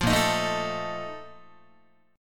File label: A sharp Major 7th Suspended 4th, Guitar; strummed